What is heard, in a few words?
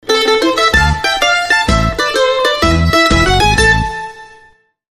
Tarantella Napoletana